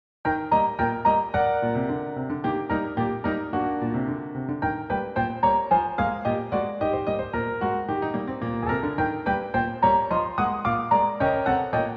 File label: caterpillar natural movement